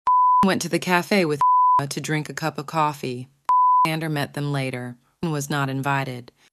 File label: masked beep noises